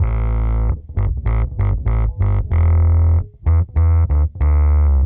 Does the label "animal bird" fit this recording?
No